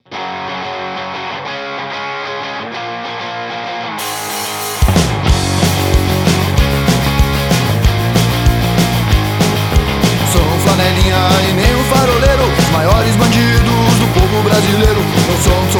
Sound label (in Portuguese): quadrilha; quadrilha de brasilia